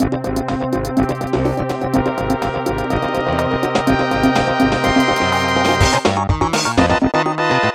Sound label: Jump Button (funky action game music), This is funk music with some cinematic and